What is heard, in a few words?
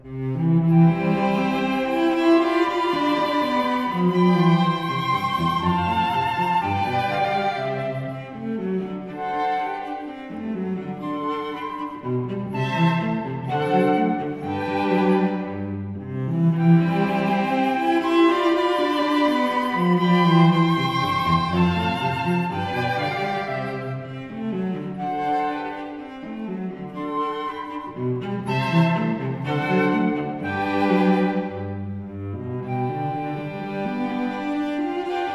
flute quartet in C; Variation II; Mozart; K.285b